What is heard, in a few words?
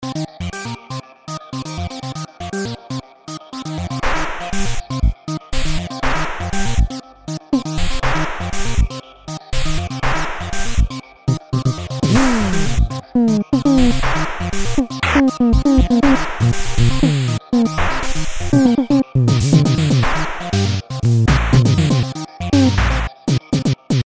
leldrums strange